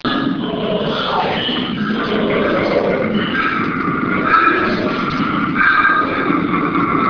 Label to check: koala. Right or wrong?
Right